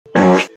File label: superbomb